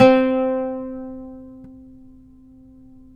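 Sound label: pluck OUD C; Roland - LA Composer vol. 3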